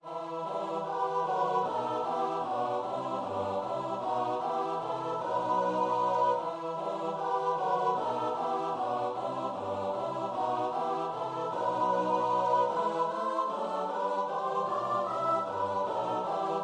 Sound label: god rest 2nd harm, choir